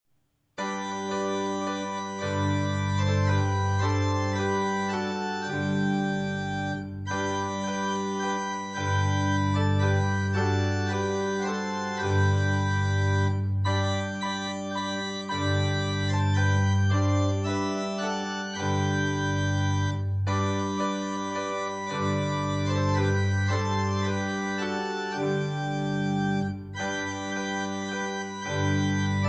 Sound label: SDA HYMNAL PRAISE AND WORSHIP SONGS INSTRUMENTAL TUNE